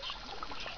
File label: puffer like, soniferous river project sounds